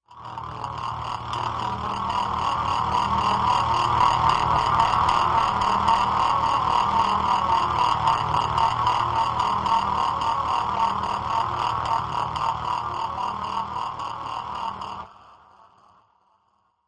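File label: Low Crix Machine Low Pulse, energy beams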